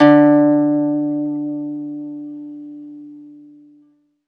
ZITHER; Alpine Volksmusik

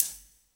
RIM SHOTS; Best Service; Dance Mega Drums

